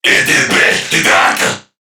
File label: Surprised Crewmen; Warframe; CorpusCrewmen; CrewmanA